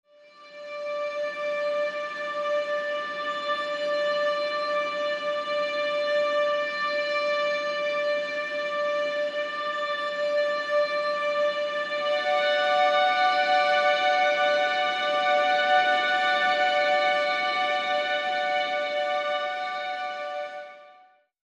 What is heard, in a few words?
Hushed Happy Strings Layer